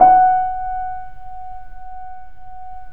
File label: The Piano, F#; InVision Interactive - The Piano; BOESINGSOFT